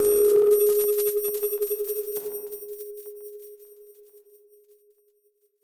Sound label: Battered Synth; malfunctioning synth samples